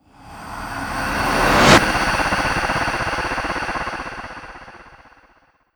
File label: Ice Shard